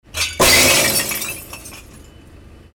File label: breaking glass waste collection